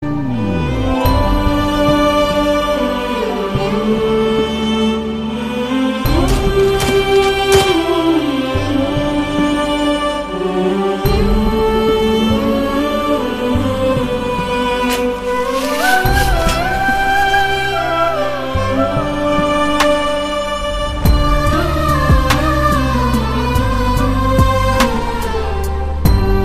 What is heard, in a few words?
Sad Music Pakistani Ringtone